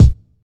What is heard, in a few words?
good kick one shot b key, Royality free bass drum one shot tuned to the B note; kick drum samples